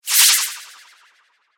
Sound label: Whoosh Cartoon, Production, Element, Whoosh, Cartoon, Warble; Whooshes; BlastWave